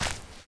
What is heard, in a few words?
walk